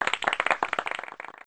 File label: applause